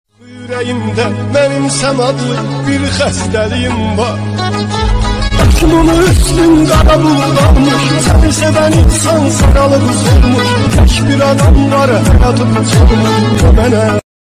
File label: Azeri Bass Music Remix Bass; Original Mix